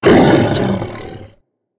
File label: short roar